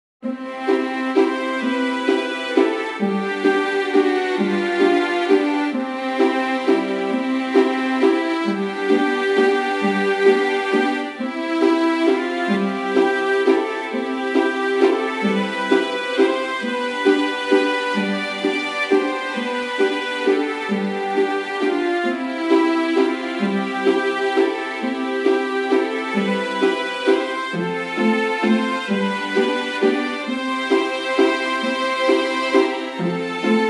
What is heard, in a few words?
waltz